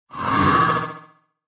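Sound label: attack windup; En kasket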